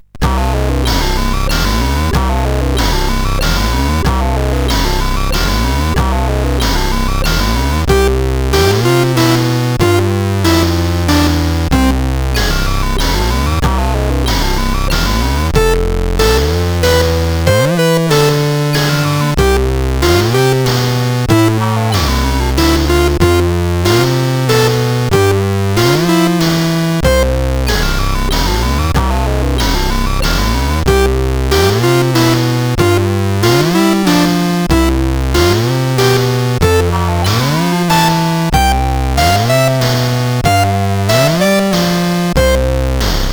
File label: CSG8580; C64-Doom-Schlager